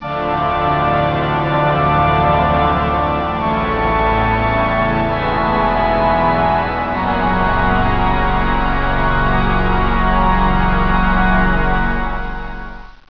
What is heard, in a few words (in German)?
Orgel; Orgel spiel